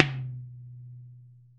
tom, Roland MC-909

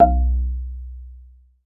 Bass Marimba B0; E-MU Planet-Earth

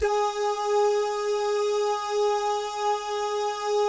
DAH, DAHS GUYS; Spectrasonics; Vocal Planet; Jazz FX

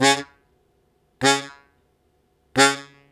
Short Attack C#0, Harmonica; Zero G - Harmonica

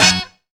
HARD HIT; Zero-G; Funky Elements

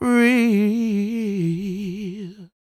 CROON, Vocal Blues & Soul MELLOW

